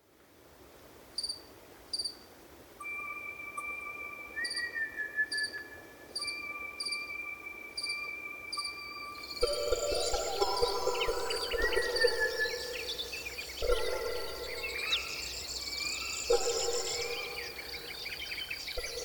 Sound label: Evening Chorus Amani Forest; Amani Nature Forest Reserve; Martha Mutiso; Wind Is the Original Radio Fridays